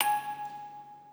musicbox

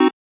Chord

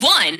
vox

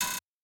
breakbeat

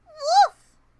Woof!; Momiji

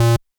bass

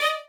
violin

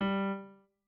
piano

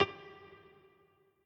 piano riff intro

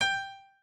piano

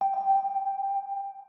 organ

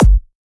Dr Kick

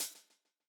hat

